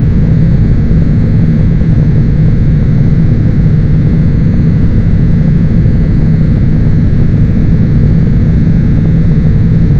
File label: a space ship engine sound